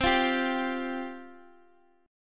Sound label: C Suspended 4th C